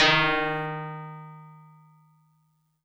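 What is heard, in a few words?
Tubular Bells DX100 D sharp 1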